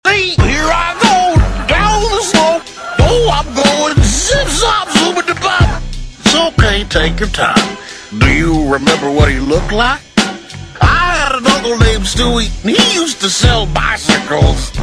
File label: Rap Remix